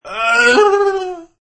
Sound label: Cartoony Confusion, Male cartoony confusion reaction, Fluttering lips; Cartoon Voices